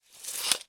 industrial tools tape measure metal retract; sound effects one

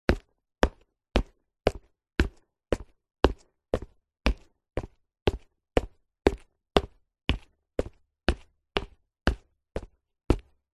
footsteps shoes school tiled floor walking

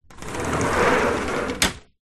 household screen door insect slide

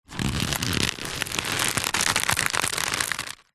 rope thick old tension movement creak stress; foley

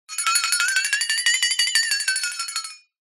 cartoon flexatone wobble shake unsteady tone; zapsplat

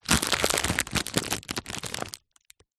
food potato chip packet rustle movement; zapsplat

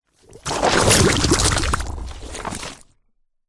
cartoon big slimy movement whoosh; zapsplat

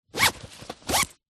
backpack small zipper fast x2

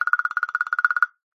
cartoon run fast; zapsplat

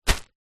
plastic bag containing clothing catch; foley

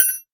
impact metal small; sound effects one

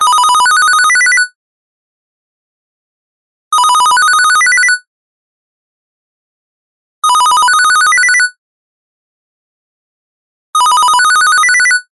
household telephone digital ring musical; zapsplat

